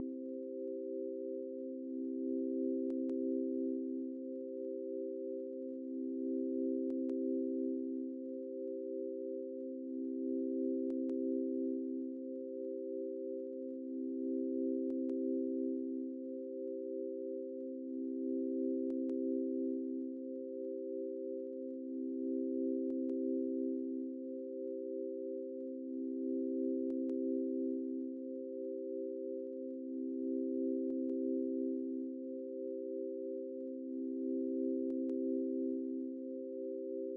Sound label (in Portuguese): ninar